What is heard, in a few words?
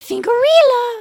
excited, emotes